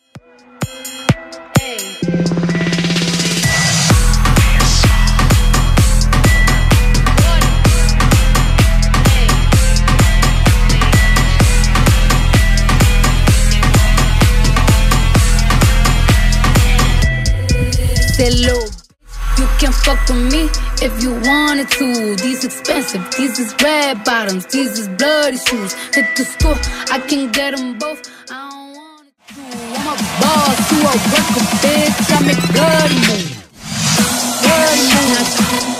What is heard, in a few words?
Bootleg Smasher 128